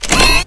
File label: chamber open; weapons; linkgun